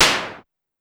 Noise Hit Lo, Drum Machines, Clavia DDrum AT, Pitched Up; 300 Drum Machines